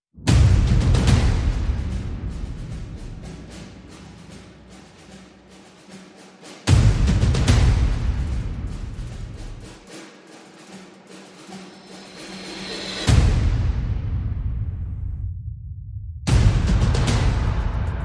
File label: Percussion Only